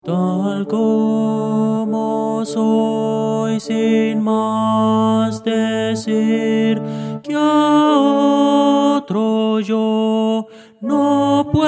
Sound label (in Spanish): tenor